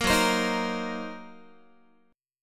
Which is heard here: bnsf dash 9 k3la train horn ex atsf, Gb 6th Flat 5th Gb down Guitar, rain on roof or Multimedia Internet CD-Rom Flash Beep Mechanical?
Gb 6th Flat 5th Gb down Guitar